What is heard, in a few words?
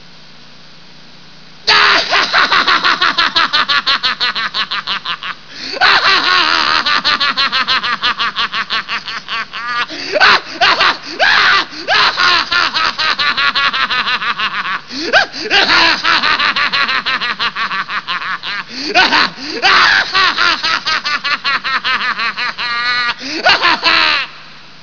Laughing; DMT